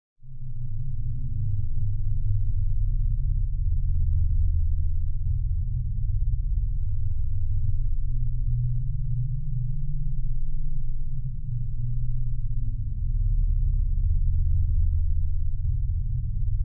Deep Evil Bass, High quality sub bass tone for tense or creepy film scenes